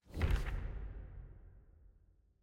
Arrow Click; Eggs

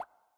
Bubble Small Pop

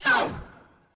Enemy Boss Hurt; bloom minigame

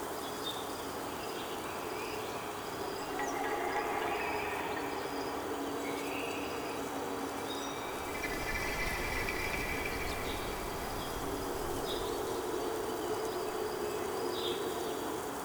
Amb Mountain; bloom minigame

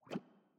Bubble Large Blow

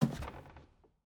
Footstep Wood Stairs

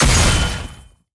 Attack Jump; bloom minigame

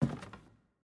Footstep Wood

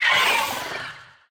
enemy death; bloom minigame

